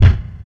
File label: bass drum f sharp key; kick drum; kick drum samples